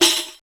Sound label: Rek Brass, Percussion; Roland BK-5